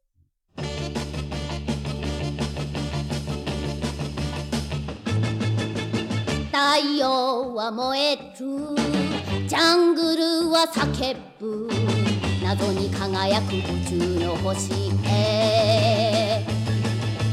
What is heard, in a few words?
Japanese Theme vocals